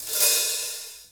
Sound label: CYM, DRUM ZONE; Masterbits - Total Zone